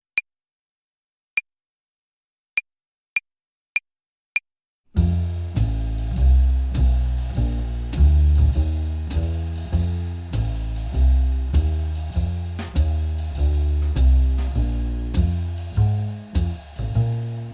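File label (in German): blues tonleiter übung play along slow; blues tonleiter uebung 2 4 und 6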